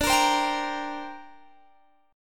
D 7th Suspended 4th Sharp 5th, down, Guitar, Standard; strummed; capo 9